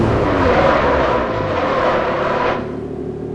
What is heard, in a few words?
throttle off, vehicles